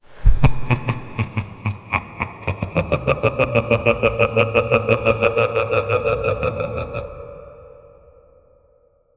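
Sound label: a deep menacing laugh echoing; chilling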